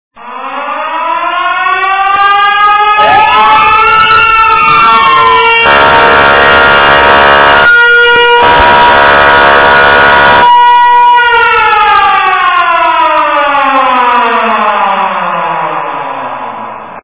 signal

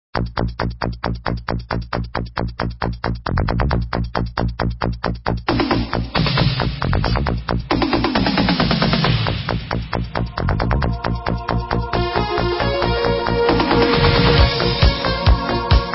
Koto

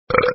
Burp

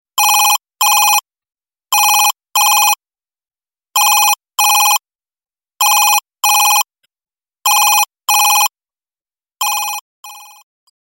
office phone

